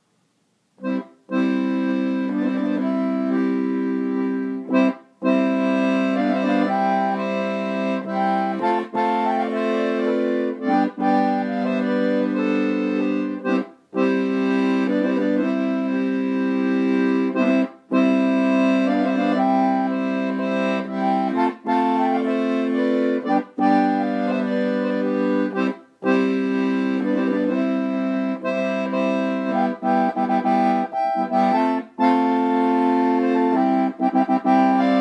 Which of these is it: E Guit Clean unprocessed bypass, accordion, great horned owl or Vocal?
accordion